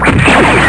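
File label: new plasma; Your Choice Software Collection 3; doom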